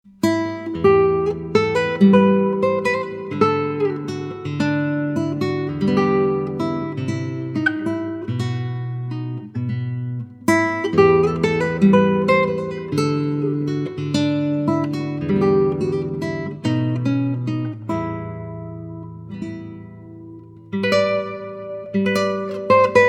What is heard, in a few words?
Classical Guitar, Greensleeves (Irish folksong)